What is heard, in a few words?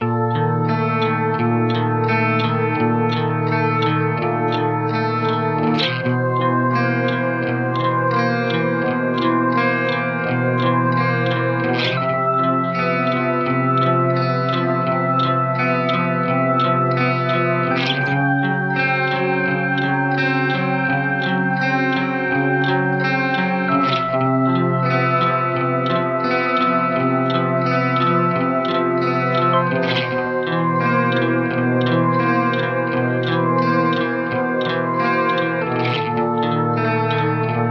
Sample guitar stereo